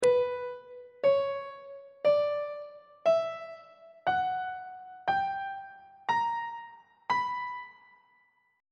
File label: b harmonic minor scale; on treble clef